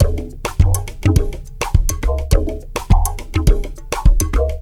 FIZZ, COW STIX; Spectrasonics; Liquid Grooves 1 & 2; 104 COWSTIX

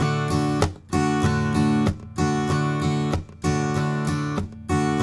riff 96 D, Blues Guitar; ILIO; Fretworks

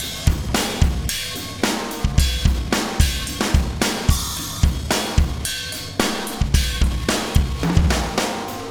Beat, Processed Drumkits

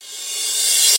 Reverse Cymbal

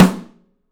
PICOLO; 3D Drums

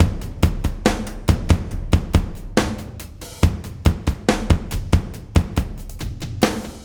SloMo Beats, Loops 140 BPM

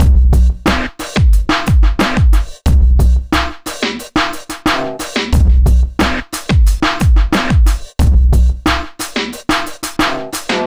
a mixed bag 090bpm, Downbeat Dance Grooves; Zero-G

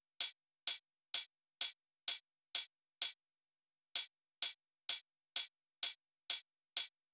Drum Loop 128BPM OH; Loop Elements; VEC5; Drumloops; Multi Loops

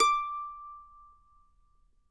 mando notes D6; Sampleheads; Whole Lotta Country 1 & 2

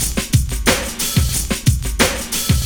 90 DRUMS

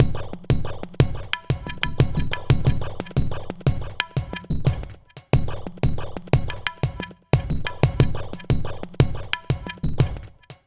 Glitched, Processed Percussion

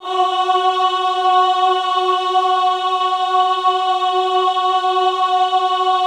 AHH F3, choir; Best Service; Extended Classical Choir; AHH MIX

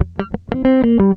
FUNKY, Grooves

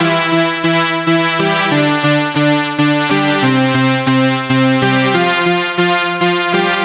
NRG Pad

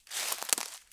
Crunchy Step, outdoor, Footsteps

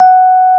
F#4, Electric Pianos; Wizoo Electric Pianos

